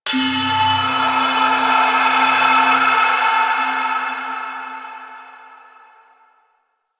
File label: Breathy Bell; Ambience